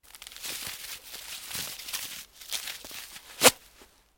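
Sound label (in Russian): открытие шоколадки с шуршащим фантиком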